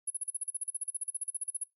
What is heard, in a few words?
Cyber